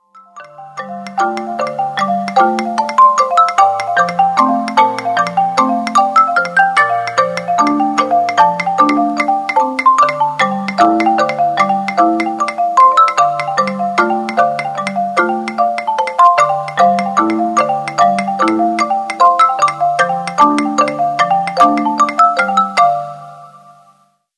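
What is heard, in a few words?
ringtone, Motorola A3100; blue lily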